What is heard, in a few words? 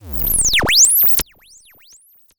Attack Zound